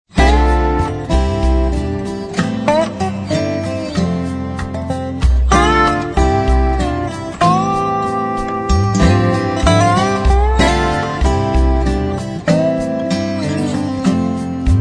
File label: dobro